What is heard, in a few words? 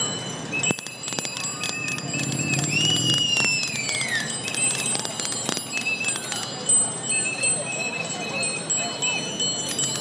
bike bells outside parliament